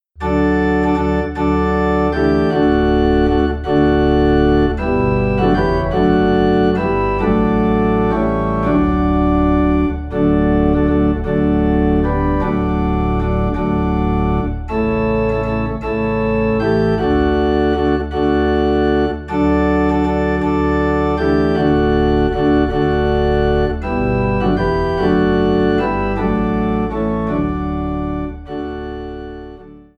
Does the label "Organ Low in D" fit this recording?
Yes